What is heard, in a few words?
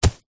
footstep single soccer boot run grass; zapsplat; foley